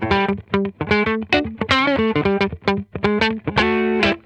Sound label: FURRY, Funk Guitar; Zero G - Funk Guitar